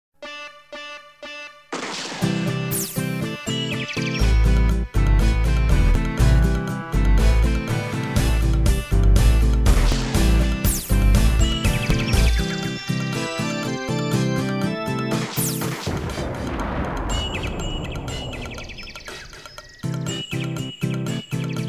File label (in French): coin-coin